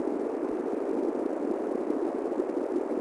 ambient; bracken

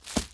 footstep run vegetation

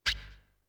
SHOES; UFC Tapout

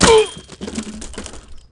fail; Matt Hoffman's Pro BMX 2; XBOX

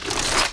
bad point

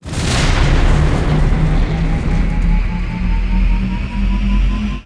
takeoff; Star Wars Clone Wars; XBOX

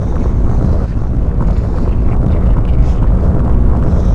volcano, tracks; draco

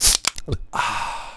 health

